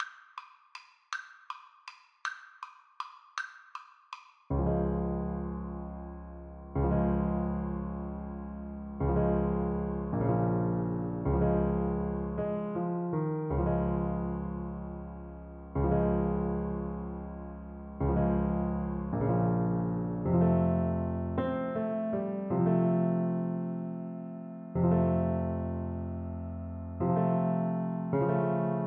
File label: grieg morning, Saxophone